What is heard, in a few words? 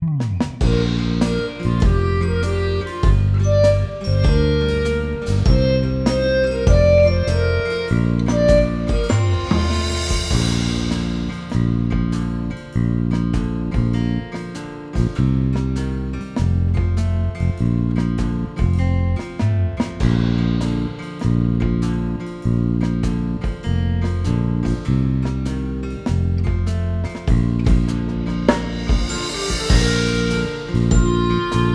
NAME SONG KARAOKE VERSION